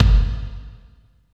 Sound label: KICK, Tekno Industrial; East West